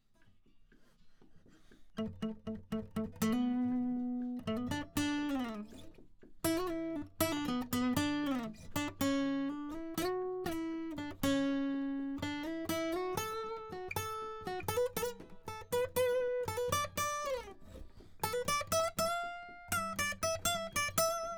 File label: Solo; Reaper project